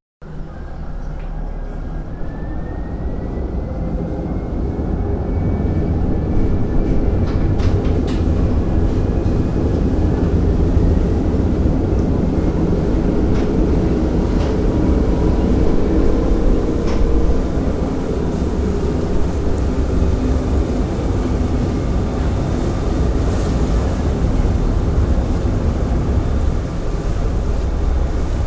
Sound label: Ferry Journey Departing, On board a Liverpool ferry departing from the dock; Boats; Vehicles